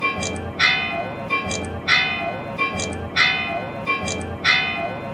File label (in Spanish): en punto, reloj y campanas horas dan